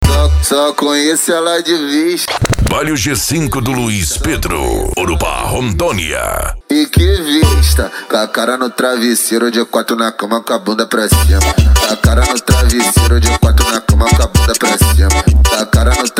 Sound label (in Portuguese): funk mega bass e forro; urupa ro